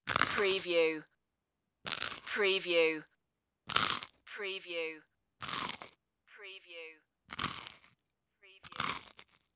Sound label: toast bite